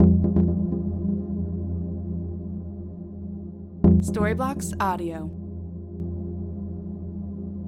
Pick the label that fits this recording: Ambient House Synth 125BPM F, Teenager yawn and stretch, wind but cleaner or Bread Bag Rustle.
Ambient House Synth 125BPM F